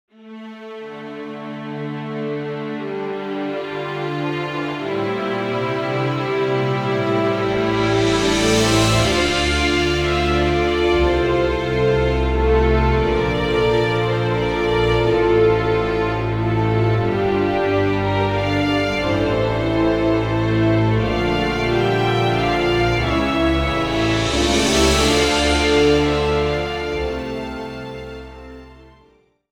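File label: background music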